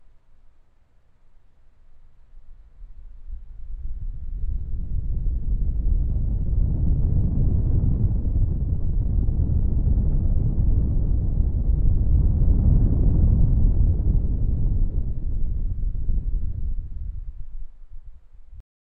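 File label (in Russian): звуковой эффект сильного ветра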